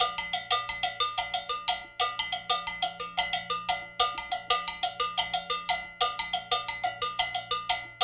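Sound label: 120 bpm, 6/8; Spectrasonics; Supreme Beats; African Contemporary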